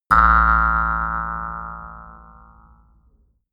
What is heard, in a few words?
mouth harp